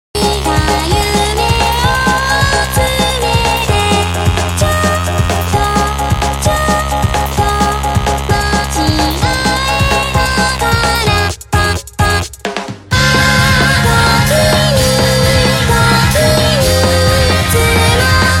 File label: some japanese song